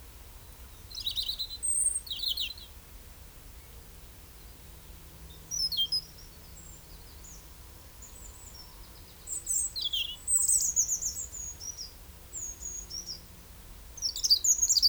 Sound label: Robin